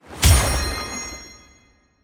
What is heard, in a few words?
energy buff; wulingzhu